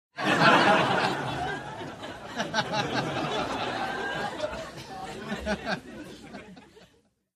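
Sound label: Crowd Bursts Laughter, medium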